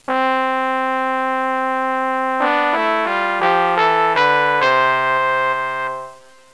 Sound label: trumpet; Music Game